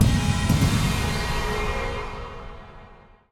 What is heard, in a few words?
Hi Hit